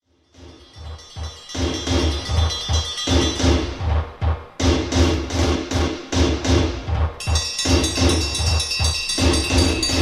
Alarm Sound

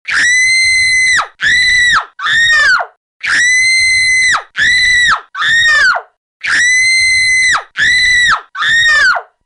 Children Scream

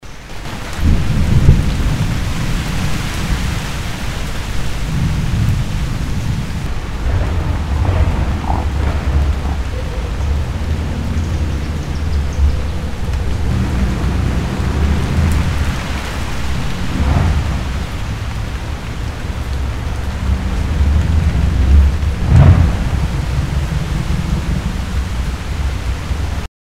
Cars And Rain

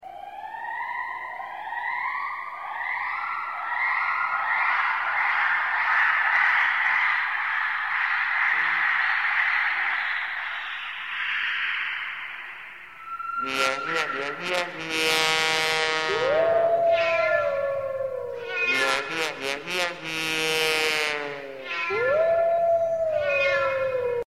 3D Scary Sound

